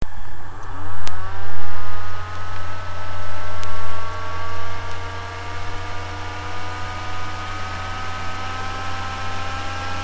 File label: cogging; vaio